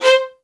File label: C5, LOUD VIOLINS; Q Up Arts - Denny Jaeger Master Violin Library